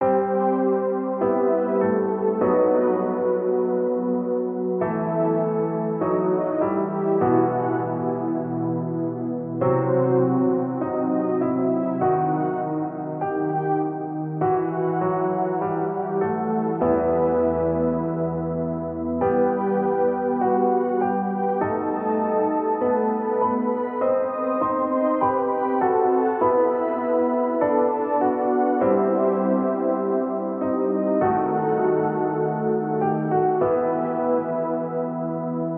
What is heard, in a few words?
game Over; calamity-cobra-2